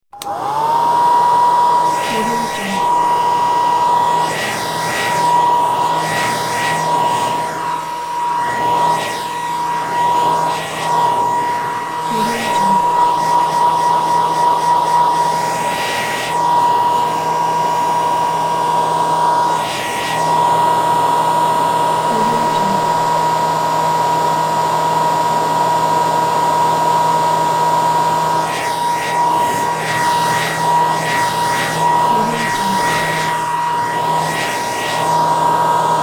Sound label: hair dryer; hollywoodedge